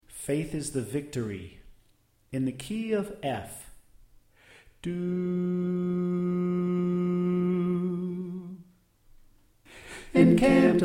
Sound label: Tenor Left